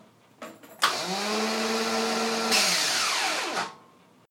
starter issue im cranky isnt starter sound; XJS X27

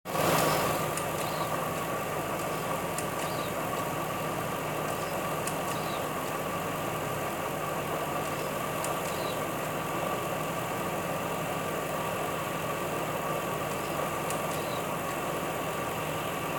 mystery clicking noise near throttle body; XJ XJ8 XJR X308

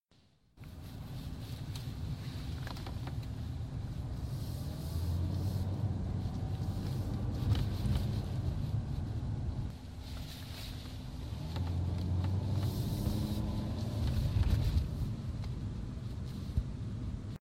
back seat, my car interior falling apart

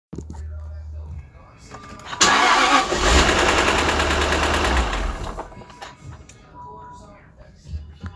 who wants try diagnose sound; XJ XJ8 XJR X308

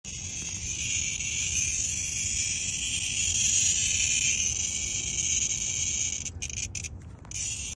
crackling hissing noise engine bay, xkr

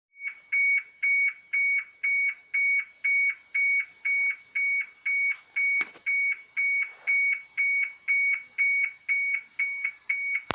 s type passive siren; s-type 4.0l v8; alarm passive problems; Supercharged V8 X200

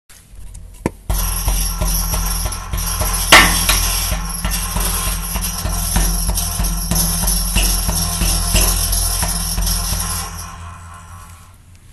squealing when accelerating turning

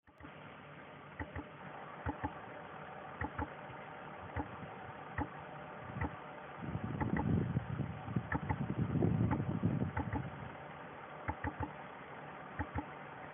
awful noise engine somewhere, noise car making; x-type x400

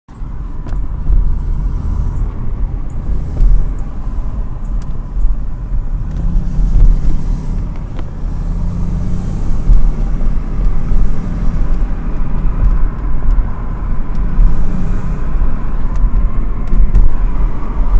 squealing when accelerating turning

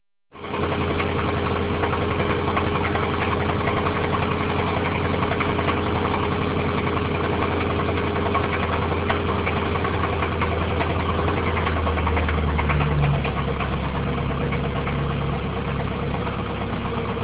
jag engine; XK8 XKR X100

